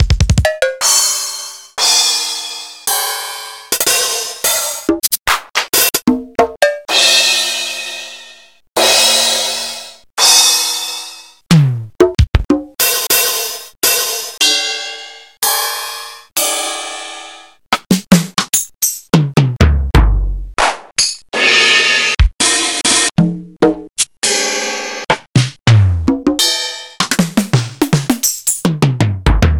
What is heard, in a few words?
Linn 9000